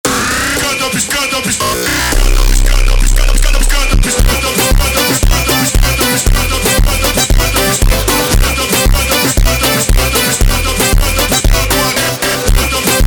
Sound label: Montagem Slowed